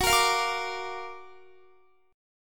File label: Gb Augmented Major 7th, Guitar; strummed; 4F,4A,5C,5G,5C,6E